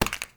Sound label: Acorn Pickup; Objects